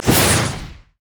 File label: hypercharge; LoL; jayce